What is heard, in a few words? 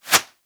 Arrow Air, Longbow; SteamVR; InteractionSystem